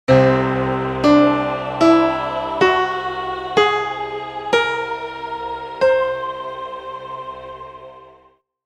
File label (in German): Ganztonleiter